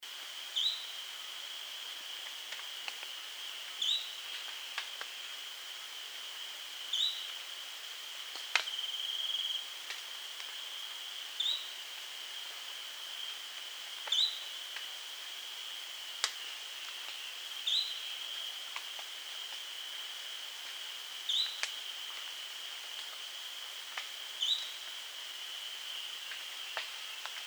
Western Striped Manakin Machaeropterus striolatus undescribed Peruvian form